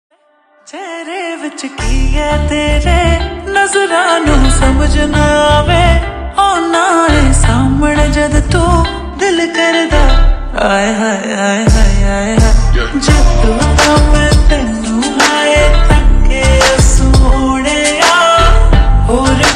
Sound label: Slowed Reverb Ringtone